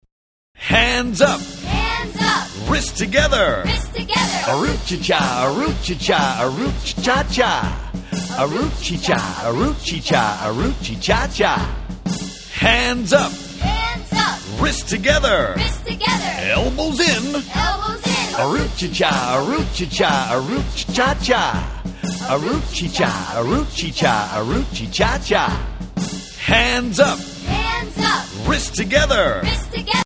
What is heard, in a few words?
a root chy cha chant